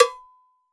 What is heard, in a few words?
Mambo Cowbell, Roland BK-5 Percussion